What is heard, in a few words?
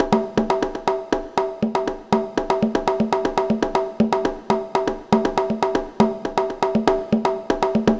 conga loop 120 bpm